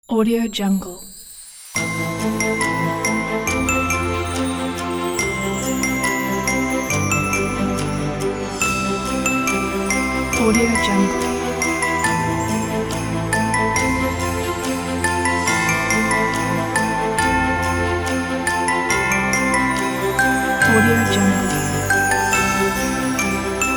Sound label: christmas music